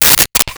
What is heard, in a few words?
Can of Soda Opened; Liquid